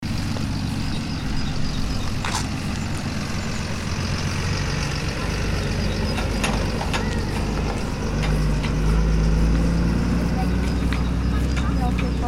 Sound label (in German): baumpflanzer